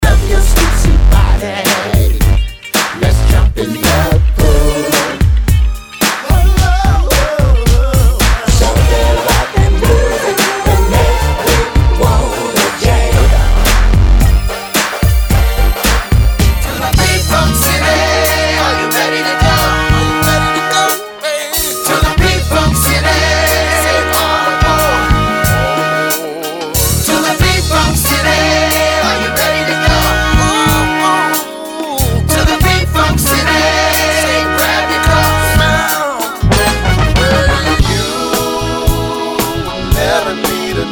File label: Funk Medley